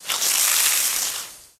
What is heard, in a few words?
Water Hose Spraying A Tree, Medium Hard Start